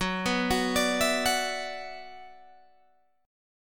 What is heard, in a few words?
Gb 7th Suspended 4th Sharp 5th, Guitar Open G; chordshape; down